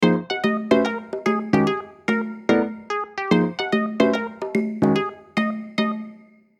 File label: Short mistery